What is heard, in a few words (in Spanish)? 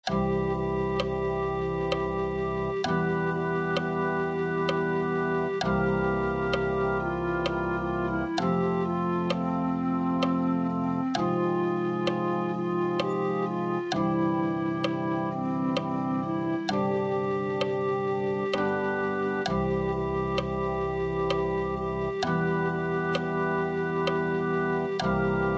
cambiame